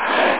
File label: ship; timekeepers expansion